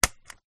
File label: Stapler